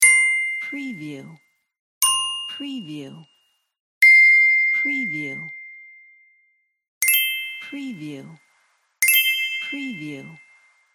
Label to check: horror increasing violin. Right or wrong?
Wrong